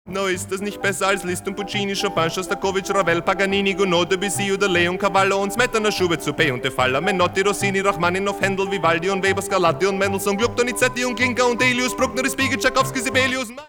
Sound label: Boogie-Woogie; mit Opa